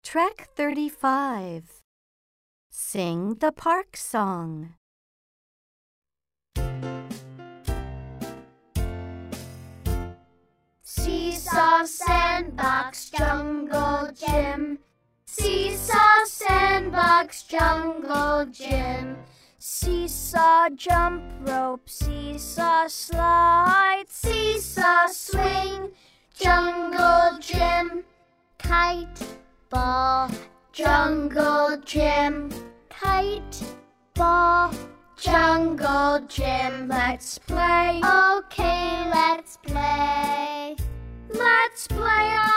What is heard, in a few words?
the parks song slow